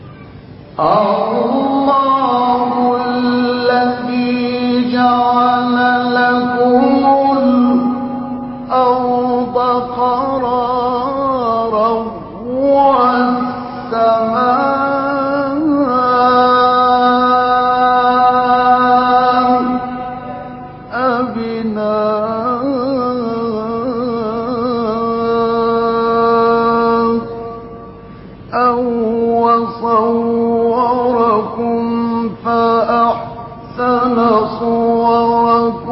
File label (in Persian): تلاوت آیه سوره غافر; آیه 64; محمود صدیق منشاوی